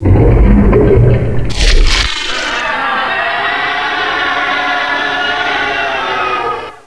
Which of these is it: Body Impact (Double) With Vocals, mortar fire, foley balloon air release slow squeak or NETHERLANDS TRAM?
mortar fire